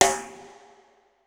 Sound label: Kit, breakcore Drum Hits